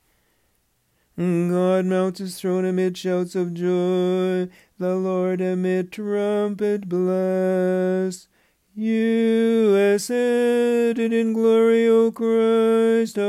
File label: Tone 4; Ascension Small Entrance Verse; Tropar Of Ascension